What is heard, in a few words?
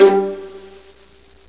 VIOLIN G; MUSIC; INSTRMNT